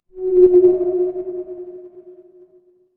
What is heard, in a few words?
transition sweep